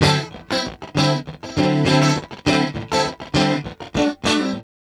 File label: Guitar Licks; Sonic Foundry (Sony Creative Software); Ambient Grooves; Guitars